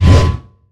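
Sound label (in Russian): мощный взмах гладиаторским мечом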